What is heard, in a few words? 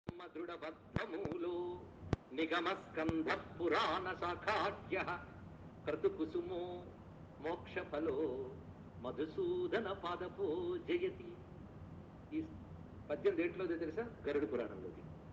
Garuda puranam padyam